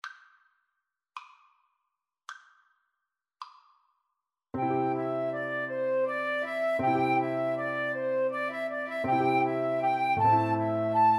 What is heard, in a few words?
Piano Trio